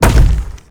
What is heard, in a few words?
impact stone medium